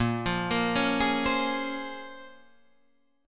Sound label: Bb Minor 9th Bb, Guitar, Standard; Bbm9; arpeggiated; 6,8,8,6,9,8; JGuitar